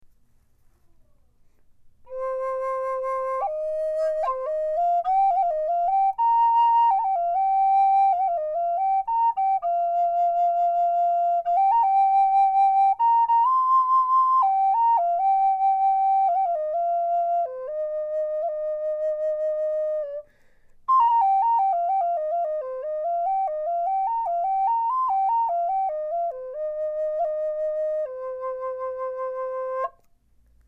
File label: High C Walnut, flutes